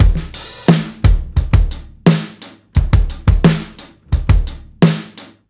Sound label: 87 16TH GROOVE, drums; Basement drums vol 2